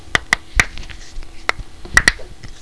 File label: CRACKING; PEOPLE